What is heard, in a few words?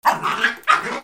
DOGS